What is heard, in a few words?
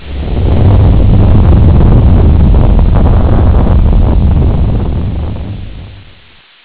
earthquake; tsunami